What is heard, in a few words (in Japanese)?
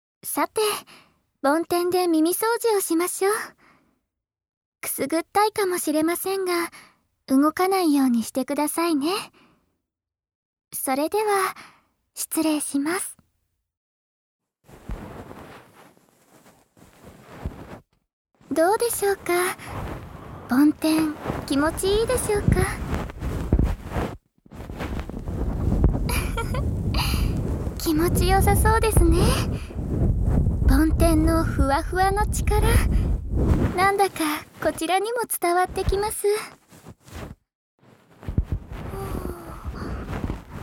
梵天で左耳の耳掃除, ASMR